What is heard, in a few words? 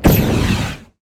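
flamethrower; Weapon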